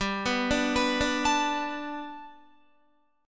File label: G Major Add 9th, Guitar; Gadd9; arpeggiated; 14,14,14,16,14,17